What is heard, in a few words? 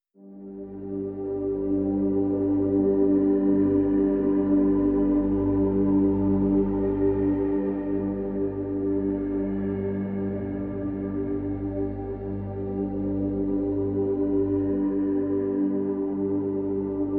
pads premonition